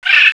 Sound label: Bird; 特酷声音素材库